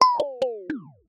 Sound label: Low Battery